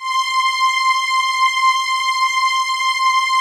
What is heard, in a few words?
PAD, DIGI-PADS